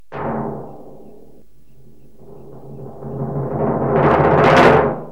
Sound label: TOMSFAST; L'Effet Pommier - Volume 04; MUSIC